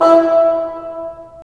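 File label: wind bassoon; LA Composer vol. 3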